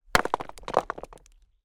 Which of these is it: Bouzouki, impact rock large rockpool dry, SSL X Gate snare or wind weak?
impact rock large rockpool dry